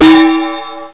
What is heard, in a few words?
DONG!